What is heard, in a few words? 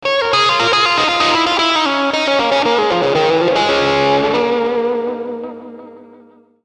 Calling Scale